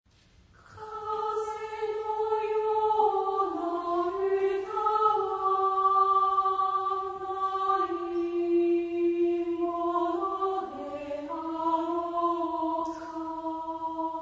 Kaze no kodama, Kaze no yoona uta wa (female), chorus; Nobunaga